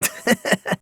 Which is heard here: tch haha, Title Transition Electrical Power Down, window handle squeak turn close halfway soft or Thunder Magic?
tch haha